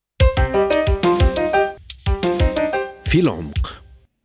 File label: JINGLE